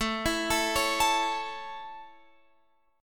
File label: A Major A, Guitar Open F; arpeggiated